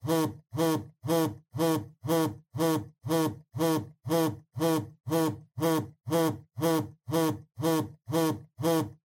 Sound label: Cell Phone Vibration (Table)